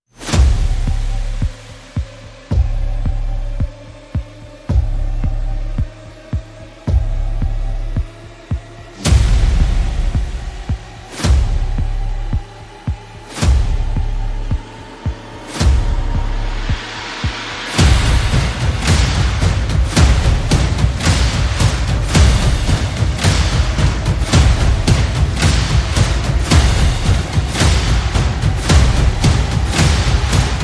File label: Percussion and FX